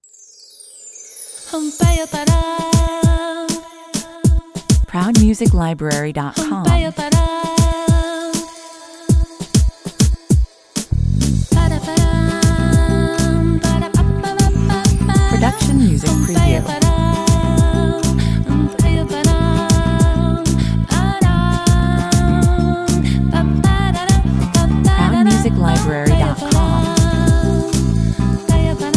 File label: accent 99 bpm